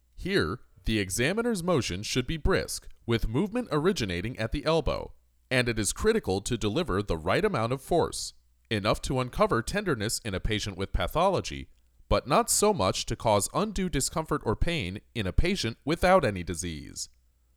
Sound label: Percussion